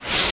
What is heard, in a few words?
cancel